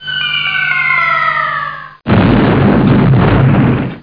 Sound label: Attack Snd; The Ambrosia Times